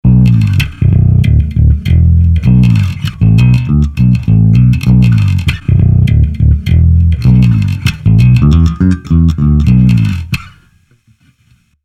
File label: Mayones Patriot5 Neck + Bridge